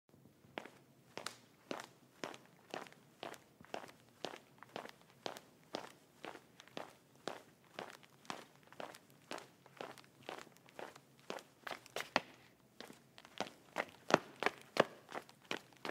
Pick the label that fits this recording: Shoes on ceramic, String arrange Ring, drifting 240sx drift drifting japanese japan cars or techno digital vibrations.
Shoes on ceramic